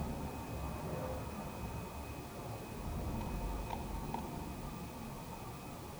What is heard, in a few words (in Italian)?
vocalizzo maschile